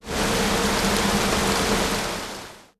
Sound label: door; Shin Megami Tensei IV Apocalypse; 3DS